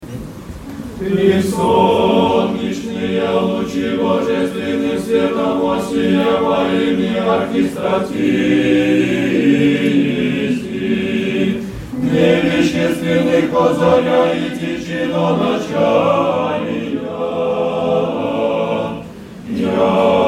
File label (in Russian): Братский хор